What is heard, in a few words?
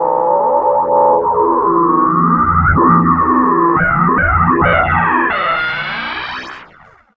SUB WAVE, Special FX Twisted